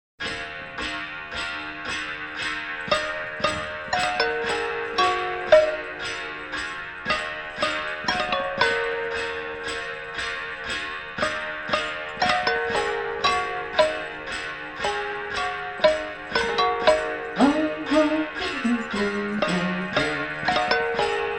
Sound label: chant